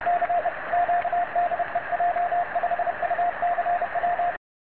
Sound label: cq cw